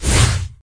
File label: Vehicles Hoverboard